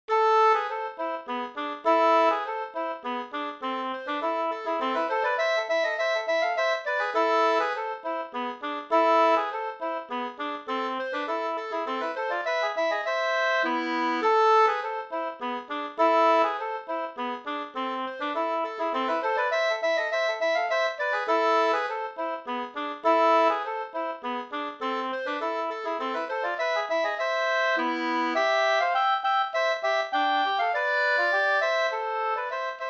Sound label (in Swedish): polska fr Lappland